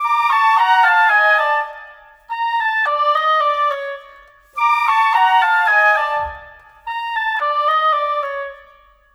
Flute Oboe, Cinematic; Sonic Foundry (Sony Creative Software); Orchestral Series VOL-3; Cinematic 11

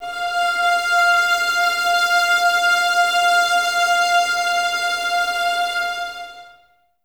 VS F 5, 11 Violins, Mute; Miroslav Vitous; String Ensembles

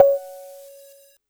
Perc Afro Casio SA-1

